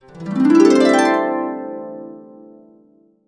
C, Harps; Complete Harps; USB Soundscan vol.62; AKAI

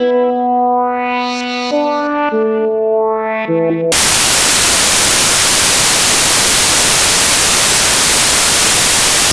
Keyboard; Sonic Foundry (Sony Creative Software); Infinite Soul VOL-2; Keys

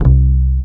Standup C, One Shot Bass

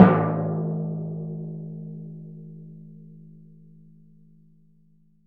TMP A#, Synclavier Percussion

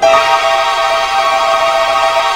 TWINKA APLE, orchestra hit; Greytsounds VOL-1

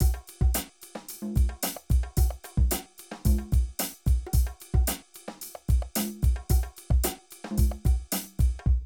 Drum loops, Streetsoul; Zero-G Groove Construction (1993)

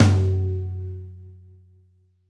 Pop Tom, Roland BK-5

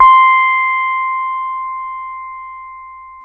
C5, LAP STEEL

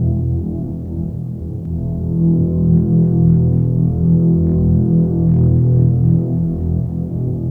Feedback Horn; Sonic Foundry (Sony Creative Software); Loop Noir; Dark Organic Processing

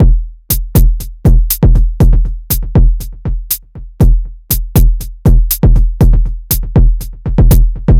Structured Rhythm, IDM Rhythms

